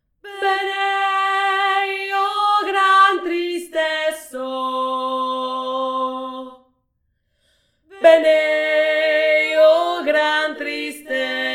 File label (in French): VOIX GRAVE 3